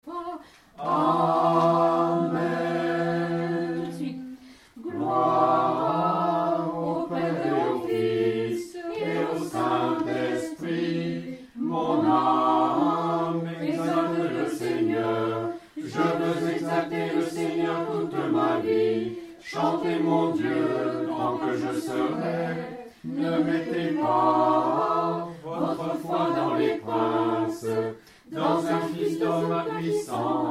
Antienne ensemble

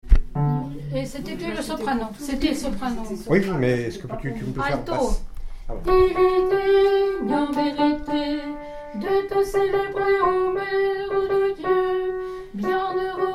hymnne A la Vierge Alto

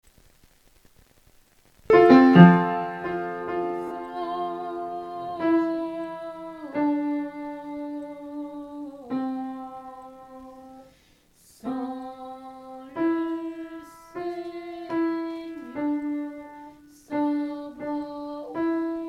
Sanctus alto

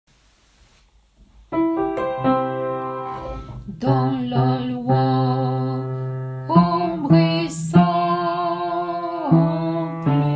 hirmos basse; A la place de Il est digne

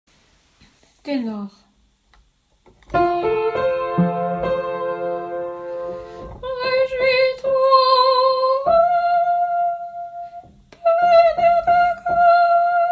Tropaire sainte Rencontre Ton 1 Tenor

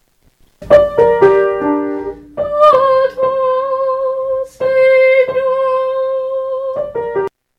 A Toi Seigneur Tenor

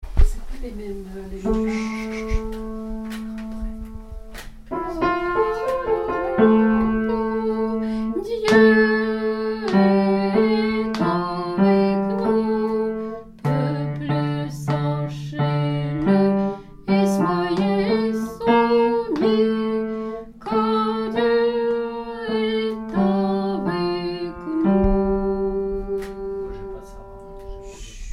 Basse; Dieu est avec nous

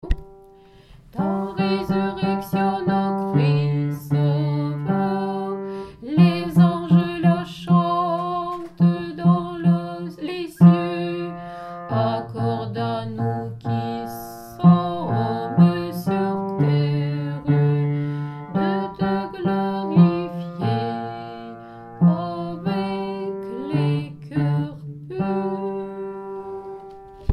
Tropaire pascal procession basse

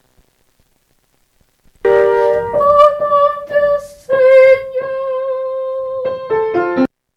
Au Nom du Seigneur Tenor

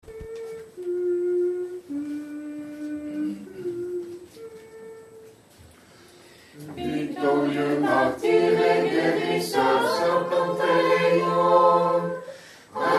Tropaire saint Panteleimon Vigile orthodoxe

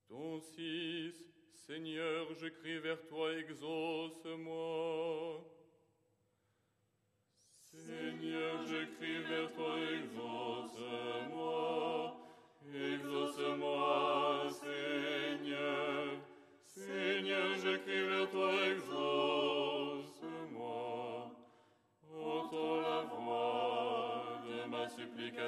stichere lucernaire ensemble

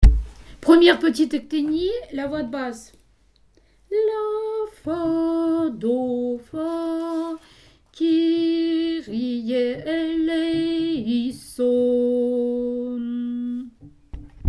Petite ectenie Kyrie Basse

